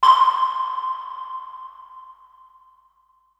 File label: noise fx; Masterbits; Switch FX